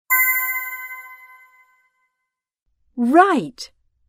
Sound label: correct